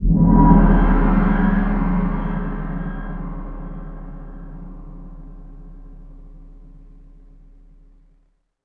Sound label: GONG WEIRD; ILIO; Synclavier Percussion 2; World & Orchestral